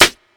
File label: Small Reverb Acoustic Snare D Key, steel snare drum